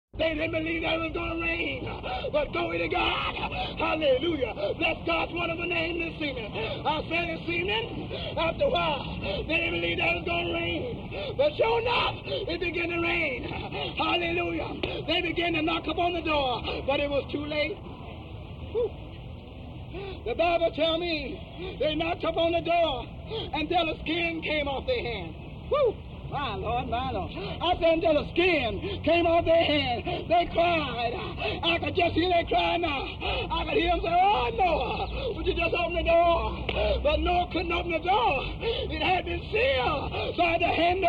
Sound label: rain